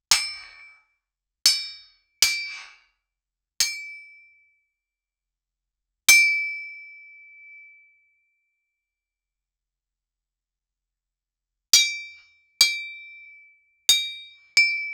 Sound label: realistic sword hitting p, battle